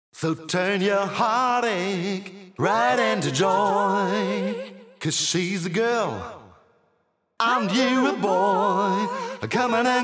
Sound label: Acapella